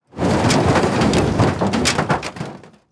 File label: metal wood debris